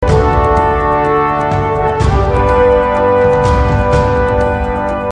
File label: Game Tune